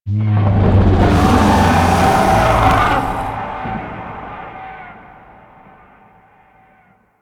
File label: Void Dragon Vocal Distant Idle; Warframe; Duviri